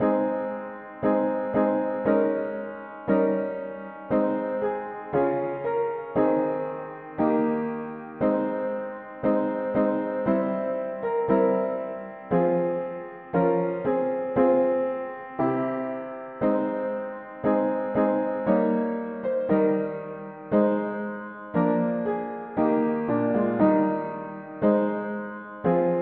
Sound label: mono piano; Chantons les graces sans melange; hymns